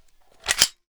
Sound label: cf05 bolt back; weapons; garrysmod